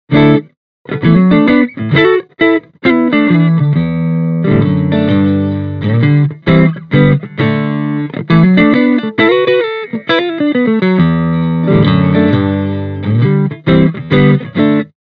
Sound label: Clean, Stratocaster; Mini Rox Mic Inside; THE GOVERNOR